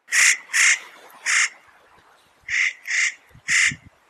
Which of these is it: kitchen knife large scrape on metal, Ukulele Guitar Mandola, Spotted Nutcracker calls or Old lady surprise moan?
Spotted Nutcracker calls